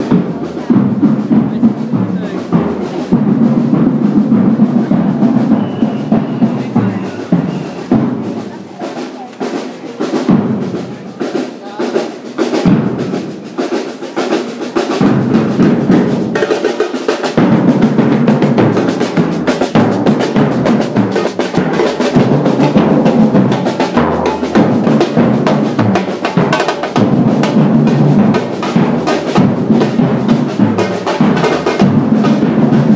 samba band in temple bar

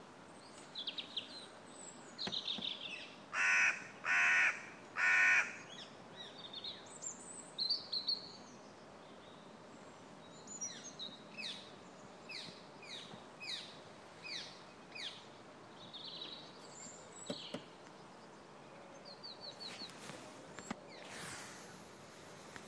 tropical birds along the canal in London

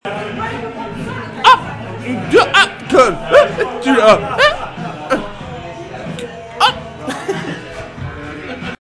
sitting hiccup singer

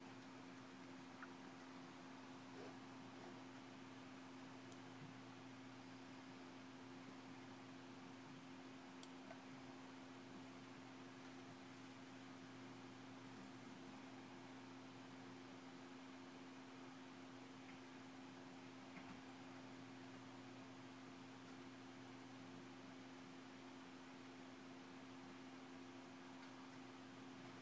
quiet office

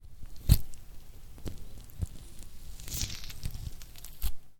opening an orange

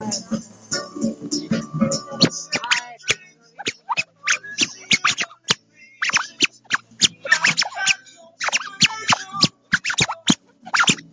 test rain jacket scratch jazz

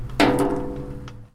drop

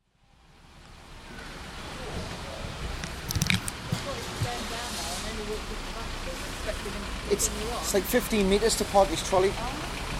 Asda ambience soundscape with some narration

